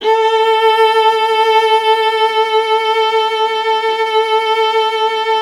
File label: STR VLA, Viola; Miroslav Vitous; Solo Instruments VOL-1